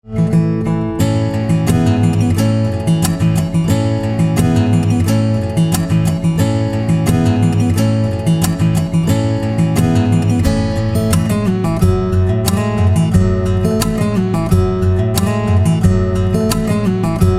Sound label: Guitar